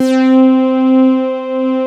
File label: MOOG, Analog; USB Soundscan vol.49; Analog Fantasies; AKAI